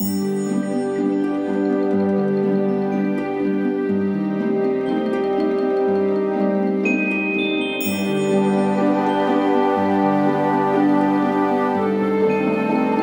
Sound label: music ingame cinematics